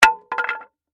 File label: Wood Plank Drop, 2X4; Materials; BlastWave